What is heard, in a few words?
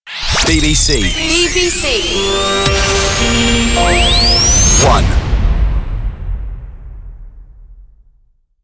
Station ID, jingle; BBC Radio 1